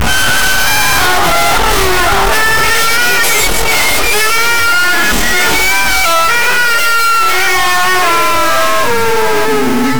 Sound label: ultra realistic group squ, mammal